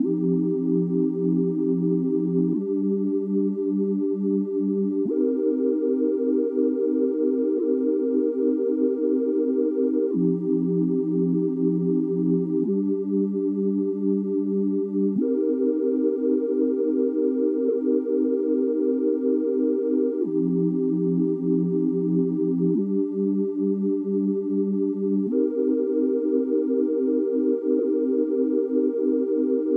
95 BPM C Min Chorus Sines; RnB; Melody; Loop Stems; Cymatics; 2022 Melody Collection; Poetry In Motion

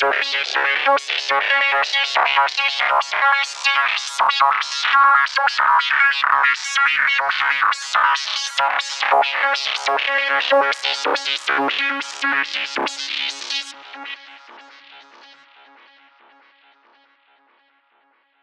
Lead Line C# 140 BPM, Psytrance; Lead Lines; Flower Of Life

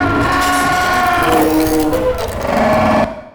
wooshes bent metal

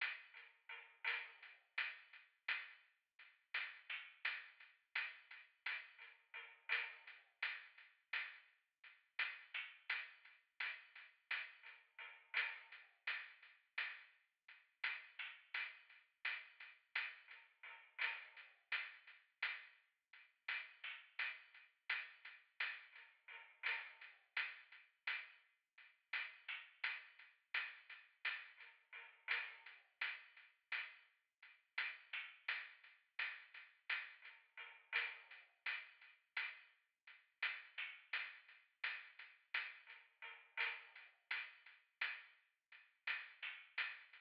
170 BPM G Min Hihat; RnB; Loop Stems; Cymatics; All 4 U; 2022 Melody Collection

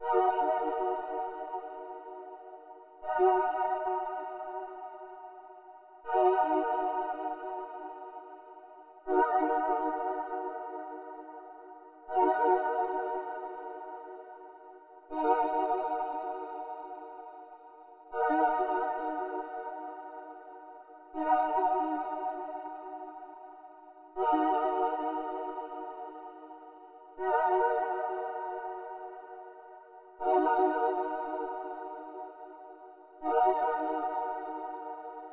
159 BPM D# Min Synth, loop stems; Melody; Cymatics; 2022 Melody Collection Platinum Expansion; Sky Blue

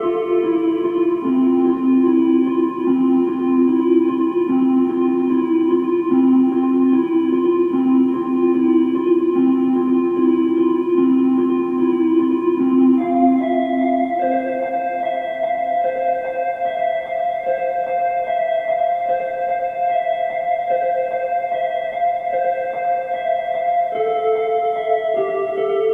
Synth Pad Dm 74, Lo-Fi; Melody Kits; Loops; Ghosthack; Home

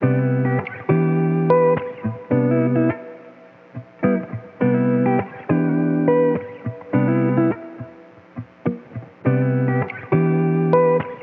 Electric Guitar Wet - 104 BPM E Min; Melodics; Cymatics; Eternity Sample Pack; Guitars - Electric

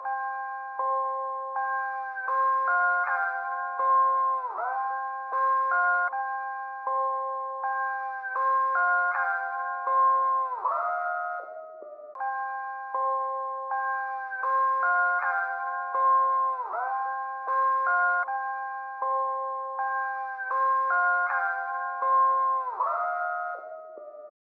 158 BPM C Min Synth Lead; Trap; Melody Loops; Loop Stems; Cymatics; Nightmares Trap Melody Loops; Street Poetry

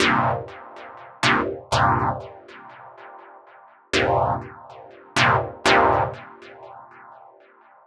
House Chord Loop Cm 122, Deep House; Techno; Ghosthack; AC2023 - Day 17 - Dub Techno & Deep House Chords

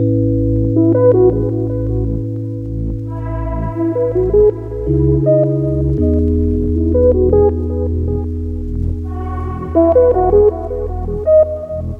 80 BPM C# Min, Hip Hop Melodies, Lofi; Vortex

